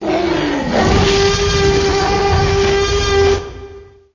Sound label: tyrannosaurus roar; paleotest